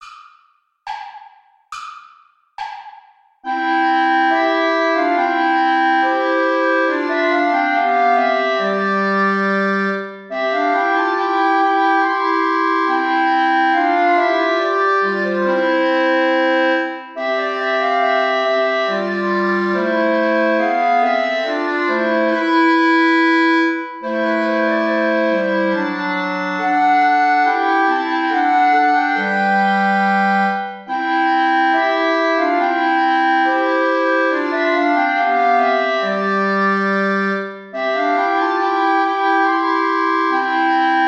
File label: play along without clarinet; haendel georg friedrich; zion daughter rejoice